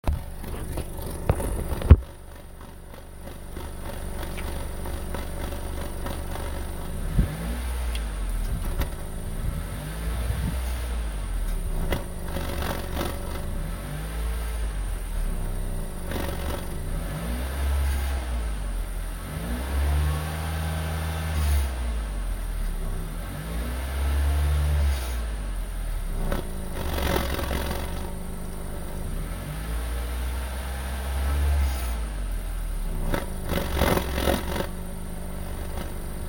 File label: why left exhaust pipe snoring exhaust snoring with gaspedal; cr-v